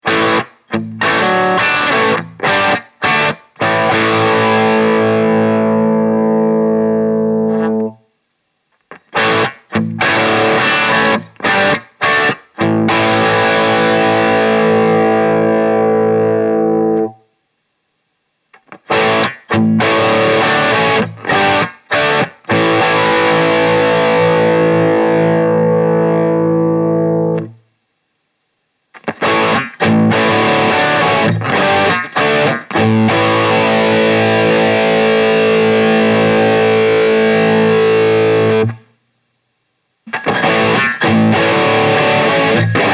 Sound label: Crunch Tube Stages